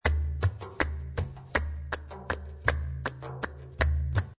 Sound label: SEGUIRIYA 80